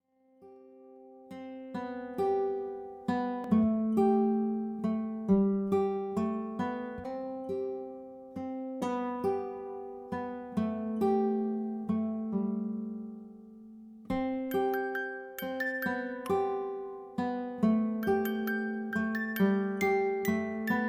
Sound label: Soothing Sleep